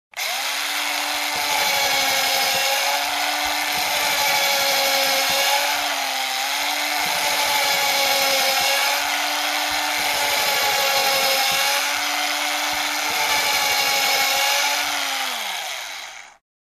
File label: Electric Wood Cutter